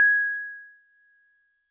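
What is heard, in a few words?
DigiPianet DX100 G sharp 6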